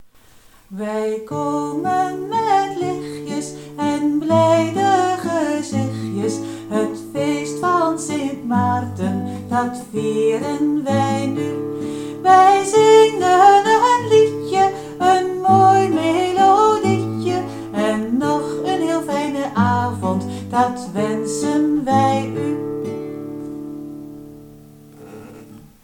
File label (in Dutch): Sint Maarten lichtjes gezichtjes